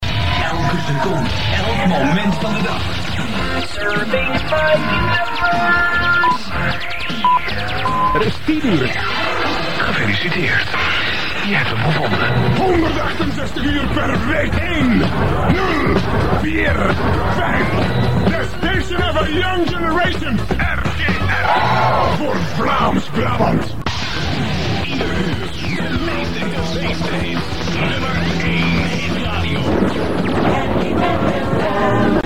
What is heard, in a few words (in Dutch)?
tijdsein en jingle